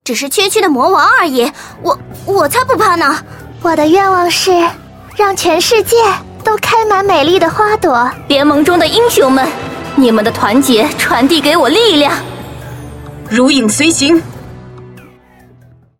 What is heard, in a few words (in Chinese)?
女 BGM